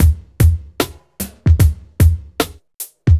hiphop

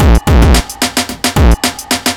block rock kit

